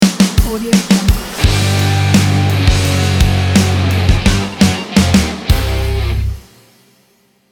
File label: stylish action rock logo